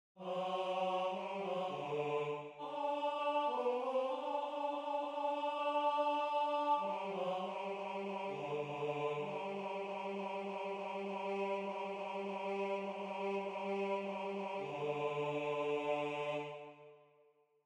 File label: Tenor